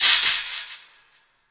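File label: cymbal, drum & bass; Ueberschall; Drum & Bass Resonance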